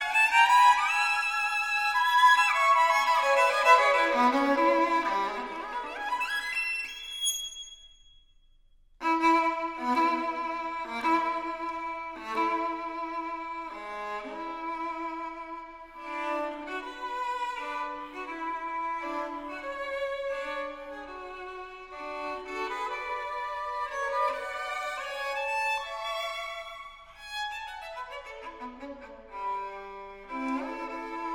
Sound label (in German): Sonate fuer Violine Solo